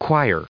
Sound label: choir